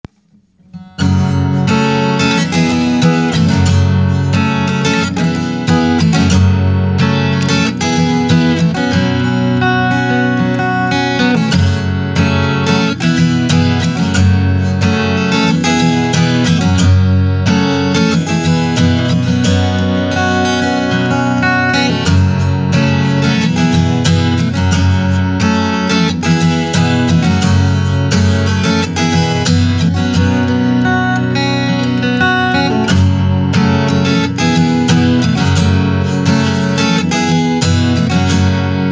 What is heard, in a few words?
Acoustic capo 2